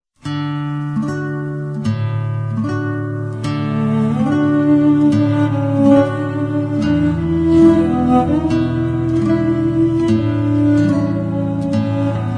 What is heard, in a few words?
Cello